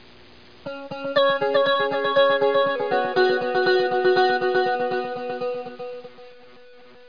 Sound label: echo guitar; Broderbund Print Shop Multimedia Organizer Deluxe Edition